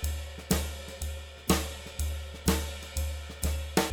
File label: SWING, Living Drums